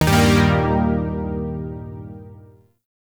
Shawzin Blues Chord; Zariman Shawzin

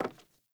Sandals Walk Wood, Footsteps; Characters; Player

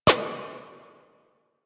Weapon Hit Effect Metal; Warframe; Caliber Chicks minigame; weapons; hit effects

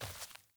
Sandals Walk Dirt, Footsteps

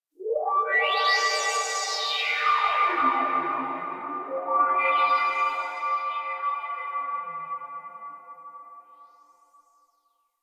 Magician Rift One Off; Warframe

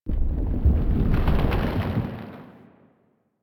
Rock Rumble; Warframe; Caliber Chicks minigame; environment

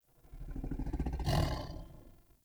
Battle Dog Snore; Animals; Vocals

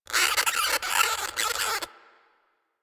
Forest Rodent Squak; Ambience; Warframe; Grineer Forest

